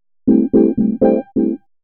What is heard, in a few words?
HOUSE EXTRA, 125